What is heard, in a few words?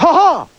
"ha ha", Voice; ooh ahh